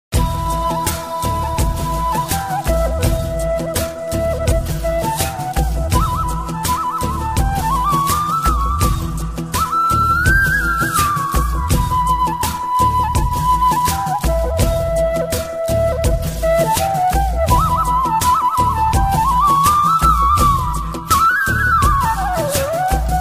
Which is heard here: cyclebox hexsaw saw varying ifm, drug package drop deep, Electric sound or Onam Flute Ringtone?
Onam Flute Ringtone